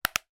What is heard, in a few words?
household nintendo ds game case click closed